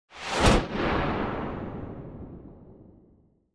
Lightning Crack; soundscrate

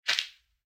footfall outdoor step; soundscrate

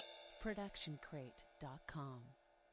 ride cymbal 20 inch; soundscrate

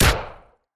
Action Gun Shot

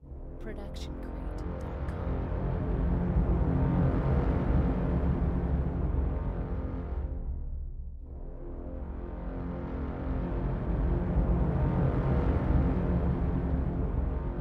hidden cave; soundscrate

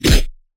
Anime Super Whoosh Punch

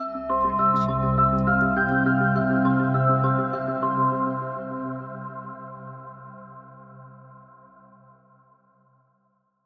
Happy Synth Ident; SoundsCrate

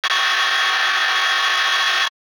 robotic arm medium; soundscrate

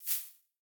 snake attack

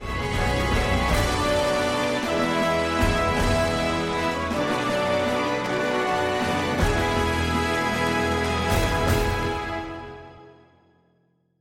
orchestral logo; soundscrate

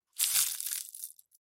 Leaves and Twigs Crunching; SoundsCrate

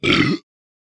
burp

